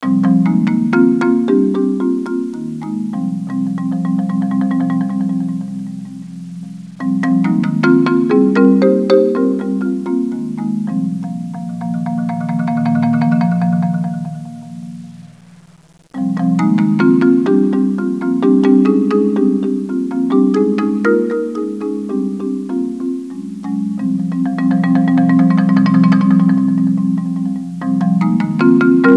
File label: marimba